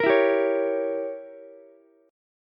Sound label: Bb Diminished 7th, Ukulele; 15,16,15,16; strummed; chord shape